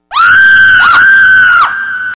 gritos com eco

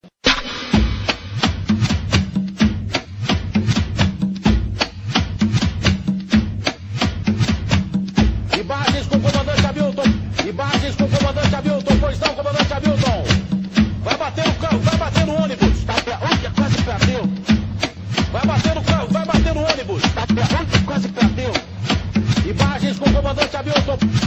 funk; datena

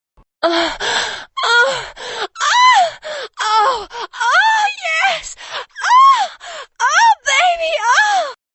som de prazer mulher

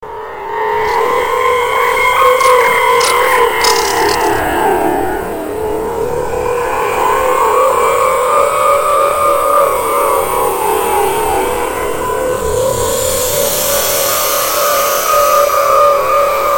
toque fantasma assustador

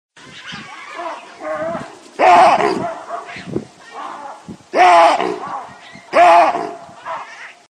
som de macaco babuino

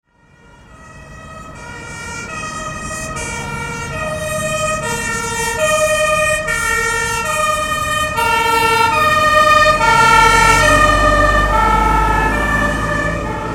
sirene carro de bombeiros efeito sonoro

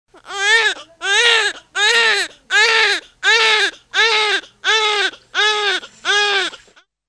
bebe chorando muito alto

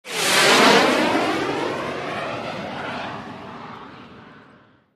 Som Missel, lançamento de missel

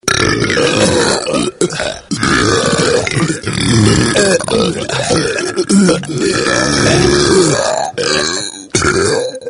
toque dueto do arroto

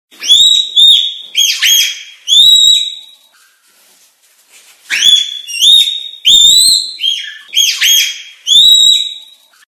som de panda filhote

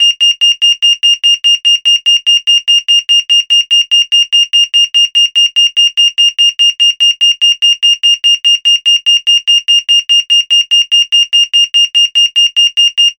Alarme Fumaca, alarme de incendio sensor de fumaca

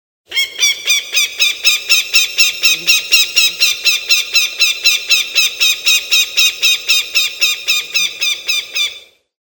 canto do falcao, sons de animais

